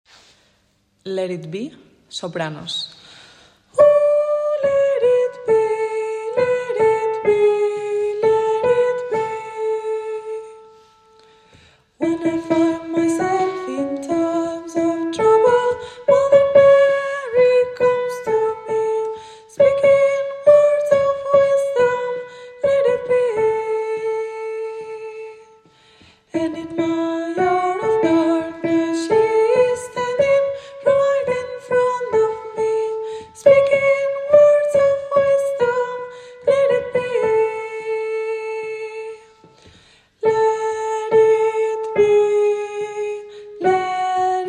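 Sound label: soprano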